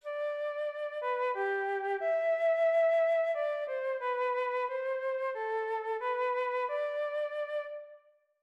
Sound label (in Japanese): motif, フルートの旋律の楽想; 作曲のやり方：楽想を得る｜着想する; フルートの旋律の楽想を / 小楽節の大きさで得ました。